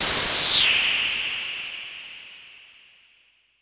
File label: Super Bass Station, Filtered Noise; noise hiss crackle